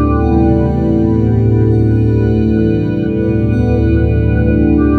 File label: long pad